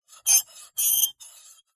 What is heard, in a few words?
warfare knife tip scrape scratch metal screech piercing